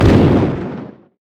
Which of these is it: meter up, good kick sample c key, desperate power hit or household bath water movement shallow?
desperate power hit